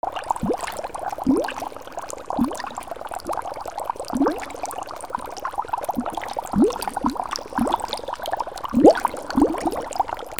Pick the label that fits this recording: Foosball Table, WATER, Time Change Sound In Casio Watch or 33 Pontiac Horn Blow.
WATER